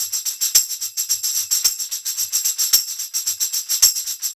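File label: percussion, Roots Funk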